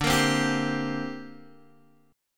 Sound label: D 7th Suspended 4th Sharp 5th, down, Guitar, Open G